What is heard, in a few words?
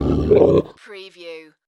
dog creature growl